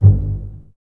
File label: BSS G 1 Pizz, String Ensembles Basses; Miroslav Vitous; 90 s Sample CDs